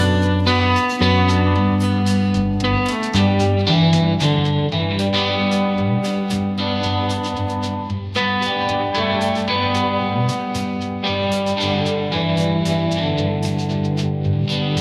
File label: ringtone country